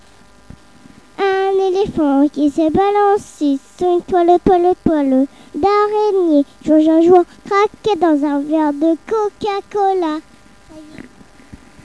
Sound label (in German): elefant